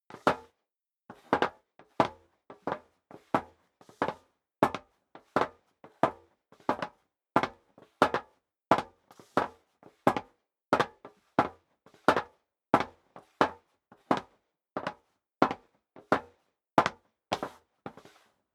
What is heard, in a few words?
step sneaker metal dry walk sequence; schritte